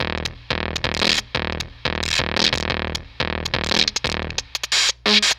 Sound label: Fuzztest drum ultrafuzz